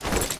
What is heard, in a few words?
move effect